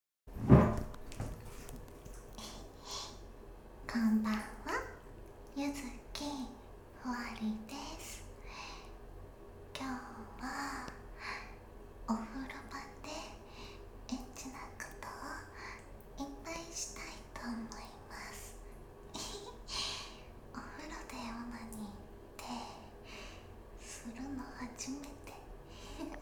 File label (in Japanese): 環境音あり